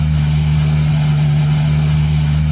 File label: IN IDLE; AOS F1 2022